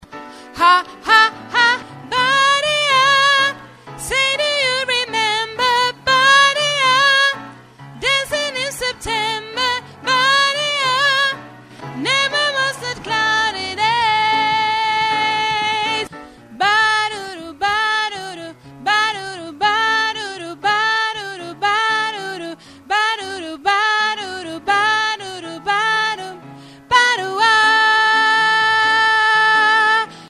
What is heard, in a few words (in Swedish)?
sopran